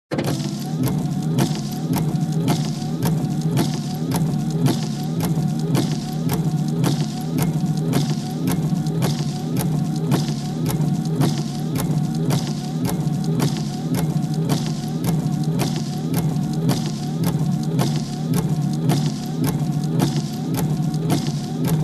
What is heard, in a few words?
Car Windshield Wiper fast